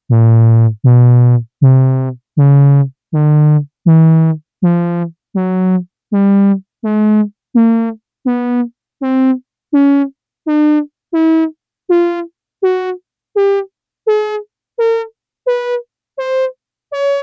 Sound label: ms2000 only kb slope; 10edo; 9 oclock